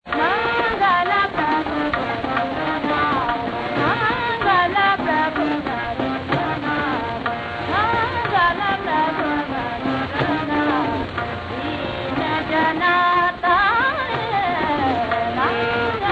tilak kamod

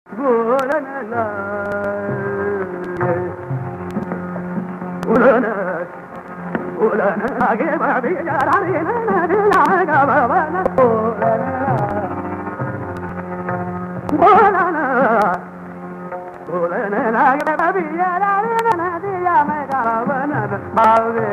shudha kalyan 78rpm drut

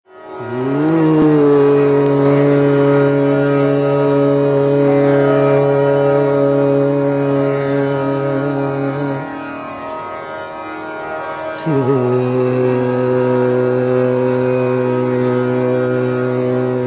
todi vilambit

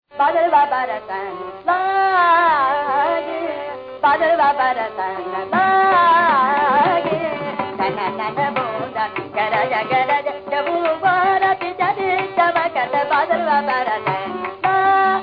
surmalhar

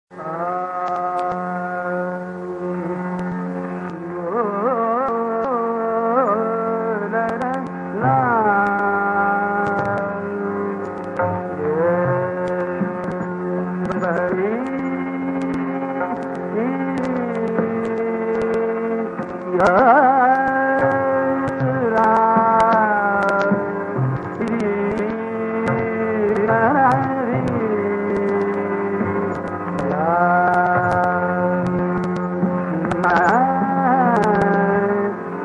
shudha kalyan 78rpm vilambit

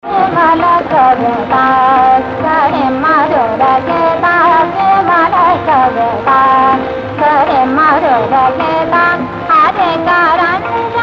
shudh sarang; odeon

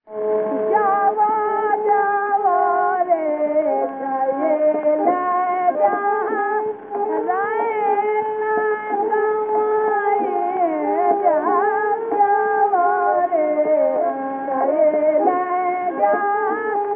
sohni thumri

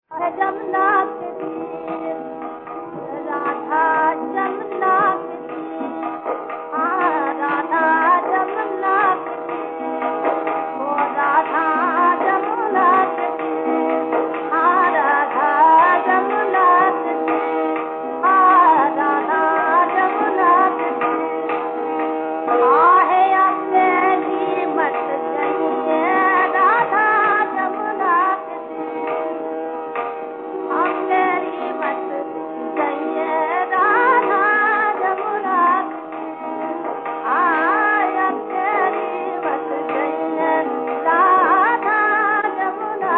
bhajan

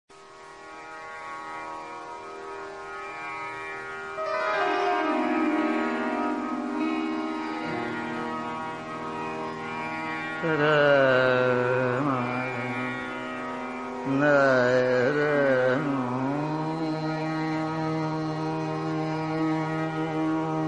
nat bhairav vilambit

